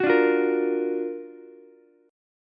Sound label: Gb Augmented Major 7th Gb down Ukulele; Gb+M7 strummed; JGuitar